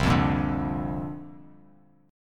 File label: C 9th Suspended 4th, chord; strummed; C9sus4